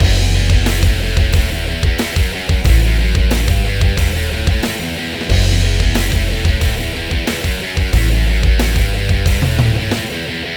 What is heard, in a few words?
Ditty; NBA Inside Drive 2003; Xbox